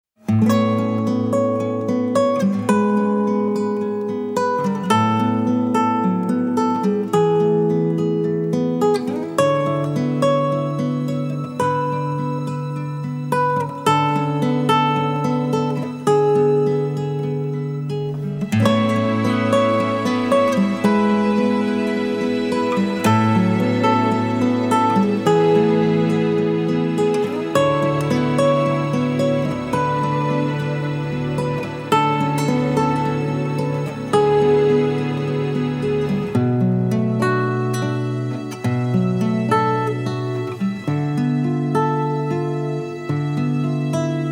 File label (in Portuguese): Romantico ao violão